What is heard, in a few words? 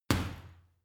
boxing punching bag uppercut hard